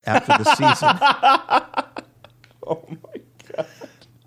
laughing saying oh my god

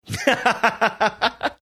laughing

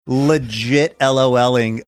legit loling

